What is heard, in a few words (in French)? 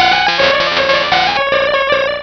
Cri